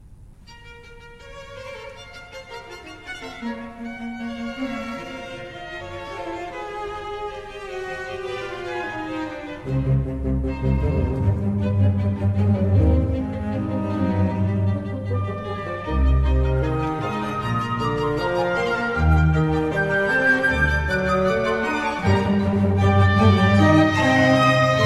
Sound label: FLUTE Ouverture